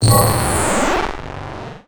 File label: alien synthetic texture; science fiction; Bluezone Corporation